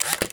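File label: Camera Polaroid SX70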